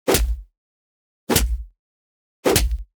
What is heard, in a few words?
strong punches to the body